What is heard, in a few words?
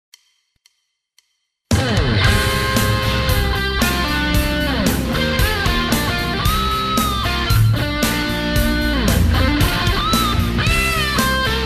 rock n roll; Solo: Felipe Ziotti